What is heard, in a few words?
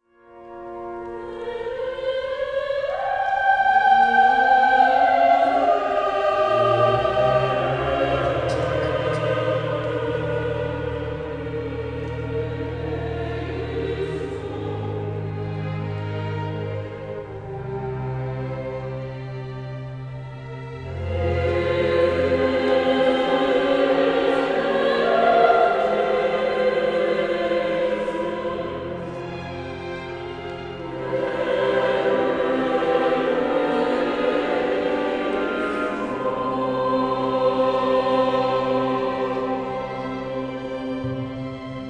Adult Kyrie